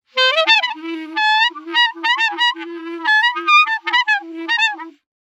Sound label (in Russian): multyashnij klarnet gus zvuk duet atmosfera smeshnoj igrivij